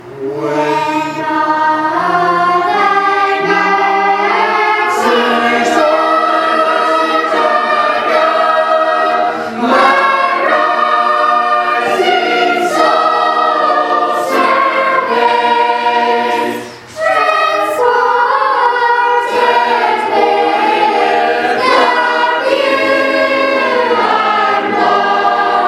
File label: singing class, hymns; when all thy mercies